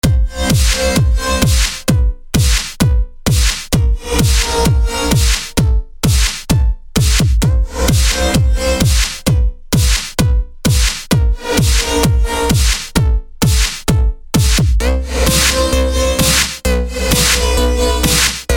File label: Kick that bass